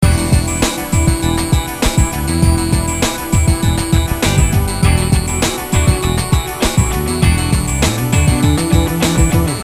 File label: loop